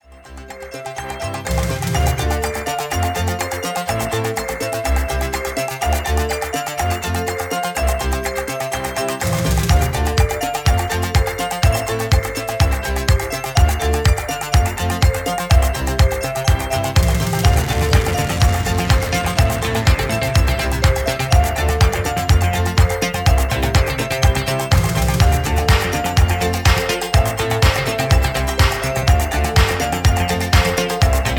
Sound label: instrumental